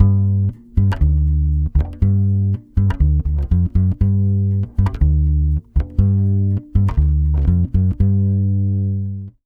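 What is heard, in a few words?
120 BASS G minor, Brazil Bossa; USB Soundscan; AKAI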